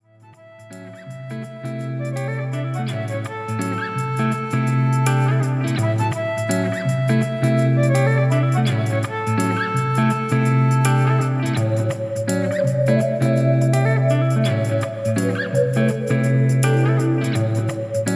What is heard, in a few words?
soft rag